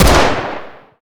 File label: fire, glock; Urban-Coalition; Decay; arccw ud